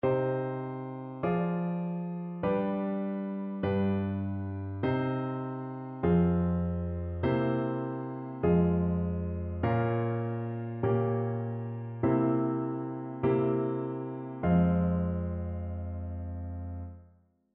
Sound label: harmonic error detection; ear training